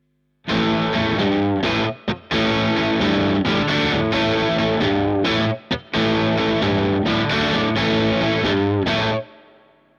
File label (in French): sat sans rien chevalet bright; bogner alchemist